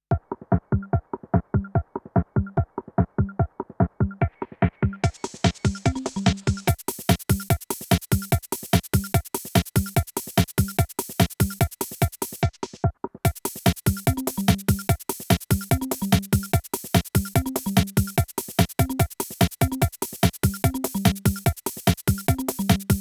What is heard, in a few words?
sampler loops 24bit